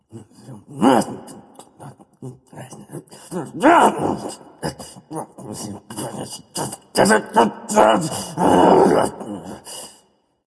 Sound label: grumbling; smite; voicepack; hou yi